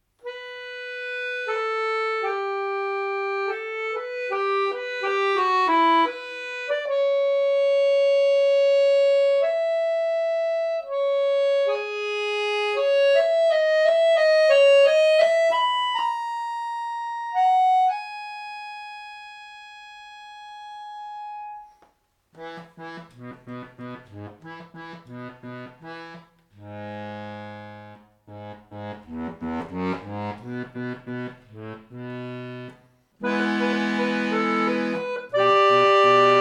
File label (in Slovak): Akordeonove miniatury